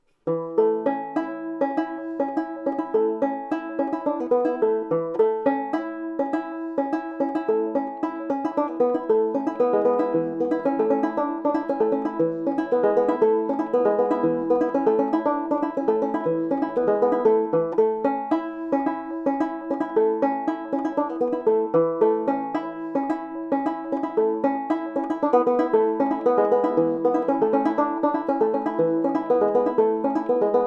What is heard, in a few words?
camptown hornpipe, banjo; early fingerstyle banjo